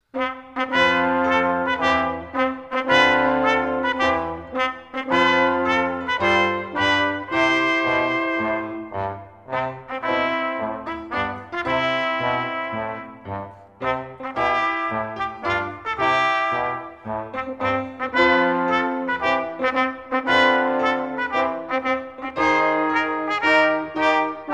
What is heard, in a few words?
music only; young man prepared; french; childrens songbook